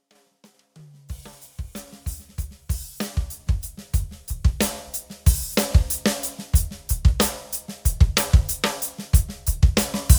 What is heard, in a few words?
Cadeson Nagashi drumkit